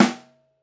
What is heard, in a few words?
SN Mono, Snare